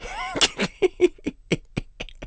Helpless Laugh; Barnes laughing helplessly

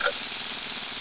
Powder Keg Fuse; Zelda; MM

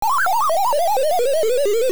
Dying; Link dying

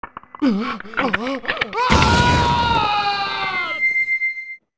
Transform On Complete; Zelda; MM

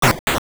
Mine Cart Track; A mine cart's track being unblocked; Zelda Oracle

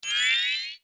Fly; Tael flying

